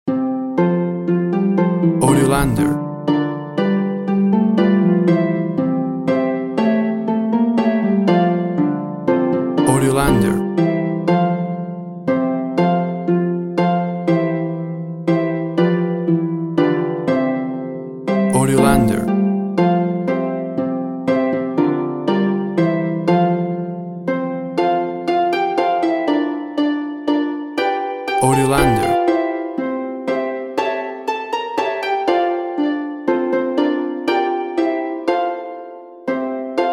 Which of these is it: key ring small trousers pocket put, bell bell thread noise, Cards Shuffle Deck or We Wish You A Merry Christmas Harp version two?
We Wish You A Merry Christmas Harp version two